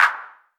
TR909 Clap, Roland SH-32 Drumset; Drumset 03

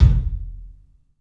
KIK, LIVE CLUB KK, Drums; 3D Sound

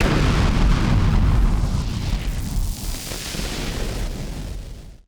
Party Popper FX

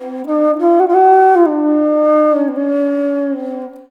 INDIA ALTO

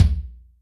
Bushy, Yamaha DTXpress Acoustic Kick

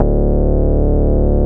BAS MINI, MOOG; Sound & Vision - Gigapack II CD 1

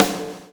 PULL, Backbeat; Spectrasonics - Backbeat

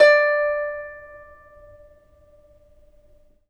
CEL HARP D4, Historical Instruments; USB Soundscan vol.23; AKAI; CEL.HARP1

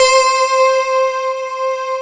PAD, SYN PAD; AKAI S6000 CD-ROM - Volume 1; SAMPLE WAVE MIXING 1

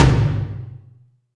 tom lo, Alesis DM5

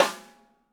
DW Green Maple Side Snare; 1999 DW Green Maple Kit; One-Shots; Sonic Foundry (Sony Creative Software); Vital Drums

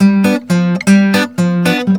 GUITAR SALSA

